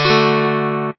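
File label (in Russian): гитара аккорд ре мажор D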